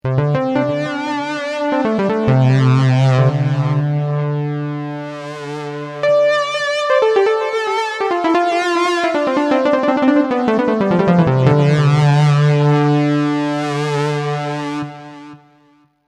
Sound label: S2 Synth Solo